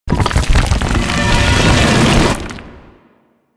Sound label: Rock Slam Wind Up; Enemies; Abilities; Warframe; Teralyst; Bigalyst